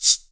SHAKER, Drums; Big Fish Audio; Ross Garfield Drums VOL-2

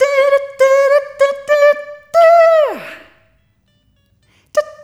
Rhythmic A

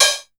hat, drum; Masterbits - Total Zone

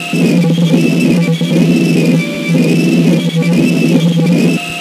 ELEC MULCH, AMB. NOISE; Spectrasonic Distorted Reality 1

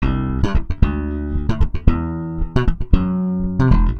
SA BS EM, BASS SALSA

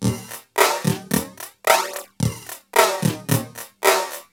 LOOP, TECHNO; TECHNO-BOX; ELEC-LOOPS

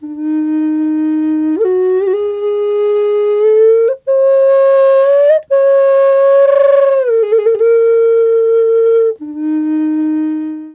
EF wild flute; Big Fish Audio - Didgeridoo and Other Primitive Instruments

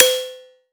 Metallic Snare

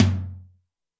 tom, Zoom MRT-3B

